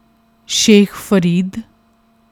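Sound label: shekh farid, singing styles of Gurmat Sangeet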